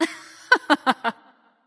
hehheh; smite; thanatos

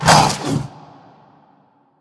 growl; smite; god sobek

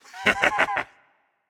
hehheh; smite; hunbatz

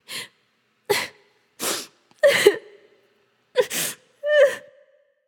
boohoo; Smite; bellona

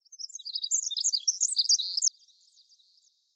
ambience good bird; smite; conquest

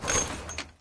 armor rattle; smite; vulcan

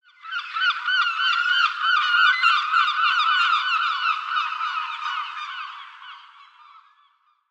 ambience ocean gulls; smite

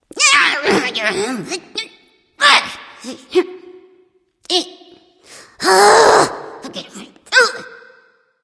grumbling; smite; ratatoskr

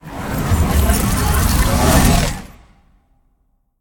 buildup; smite; olorun

